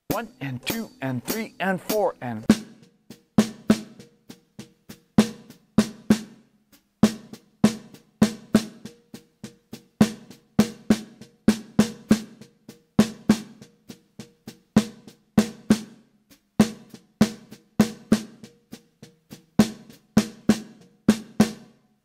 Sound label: Melody Straight 8ths